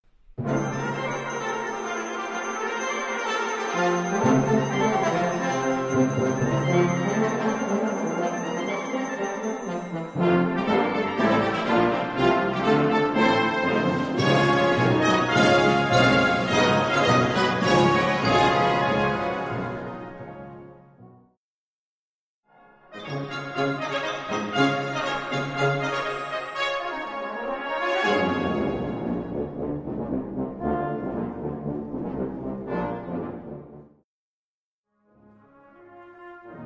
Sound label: Quebec Brass Fantasy; Howard Cable